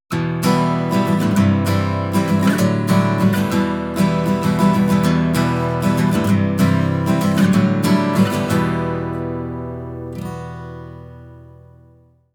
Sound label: minor key; fretjam